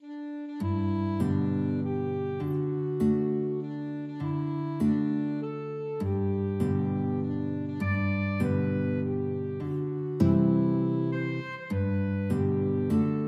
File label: alto sax guitar duet